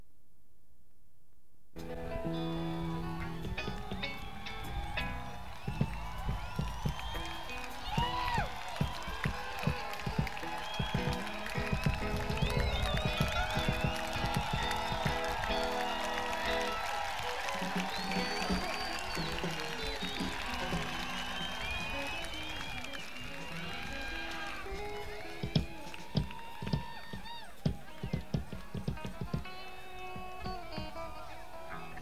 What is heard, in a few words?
Crowd; senn 421